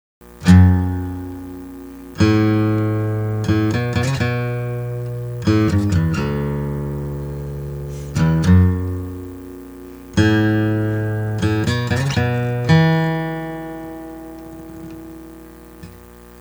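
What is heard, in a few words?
Acoustic, guitar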